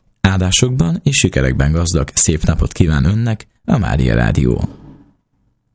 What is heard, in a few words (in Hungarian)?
Reggeli J